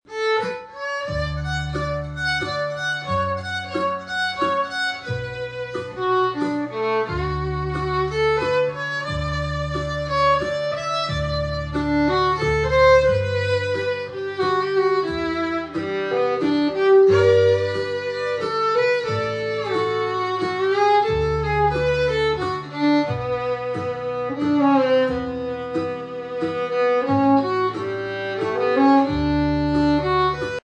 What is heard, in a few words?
fifty year ago waltz, Texas Fiddle